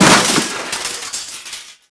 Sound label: Crash Junk; Max Payne; subway